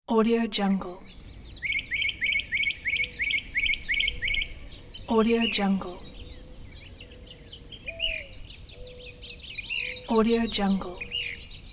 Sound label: birds song